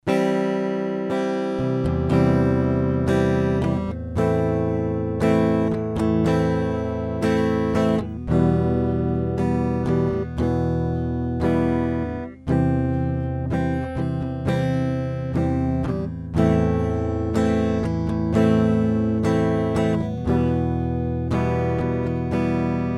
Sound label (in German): EVO 8 Gitarre